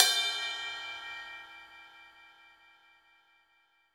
Pop Ride Bell, cymbals; Roland BK-5

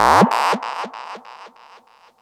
fx dub wail; M8; M8JUNGLEPAK1

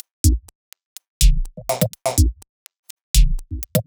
Top E 124bpm, House Drumloops; Zero-G House Fabrik Drumloops

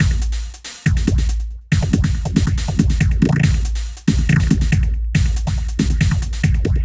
space frog, hyperspace pulse grooves

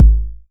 909 BD; Goldbaby Tape808 & Tape909; The Tape 909

